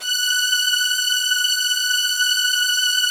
PAD, ATMO PADS; Best Service; XX Large

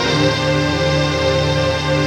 RAVE PAD; Best Service; XX Large; Pads

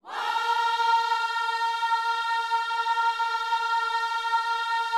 "Whoa", Gospel vocal, A#4; Spectrasonics; Vocal Planet Vol.1 Gospel; akai

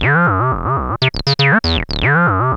TB-120

